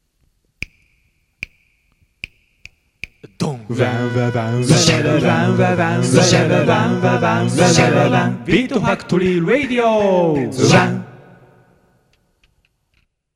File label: Radio Station Jingle; Beat Factory Radio Station Jingle B-type -2023 Mix- (Mono); コーラス